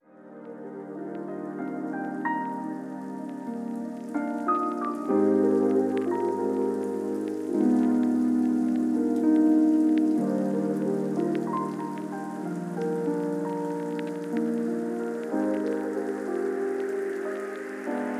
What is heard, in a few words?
Celest Intro Stinger, Kawaii Love Lofi Music; Kawaii Love Lofi Music Pack 2